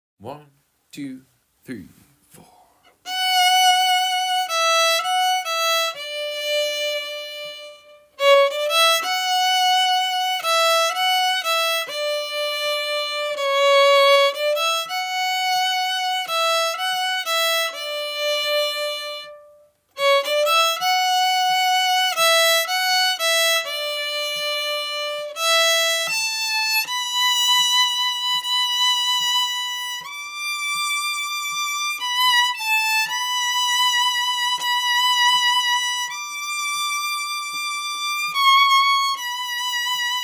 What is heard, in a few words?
maison de glace pads, fiddle